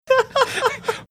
cute laugh

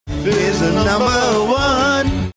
singing along right way to rock

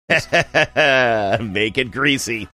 laughing and saying make it greasy

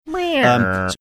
making sad horn like sound

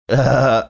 elmer fudd like laugh

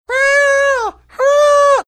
kid crying or yelling sounds

